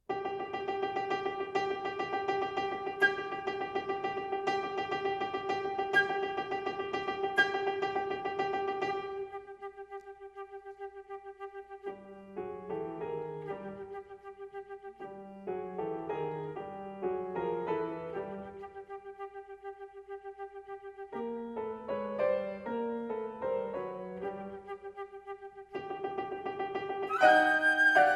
Toccata

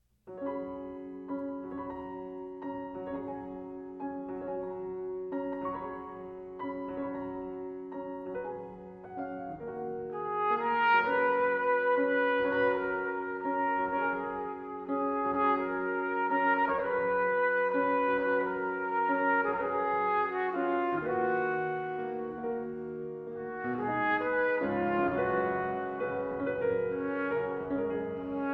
Sonata for Trumpet and Piano, Allegretto; Music for the Soloists of the ABQ

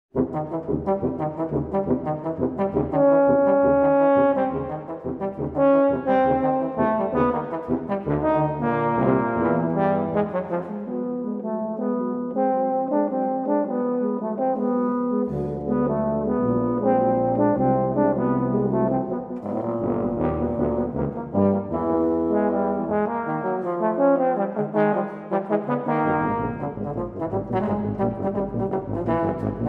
Quartet for Tubas, Vivo

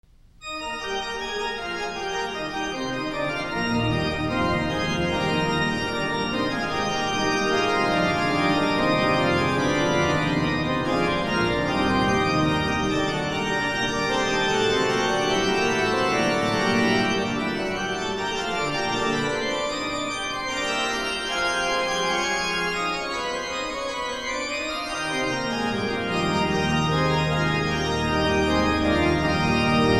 Preludes, in E-flat Major, Brass; Short Preludes For Organ - VII. No. 22

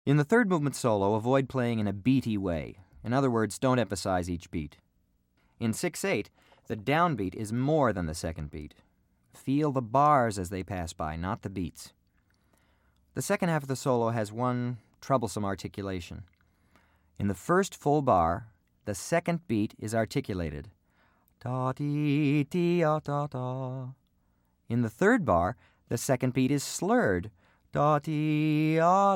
Violin Concerto, Orchestral Excerpts For Bassoon

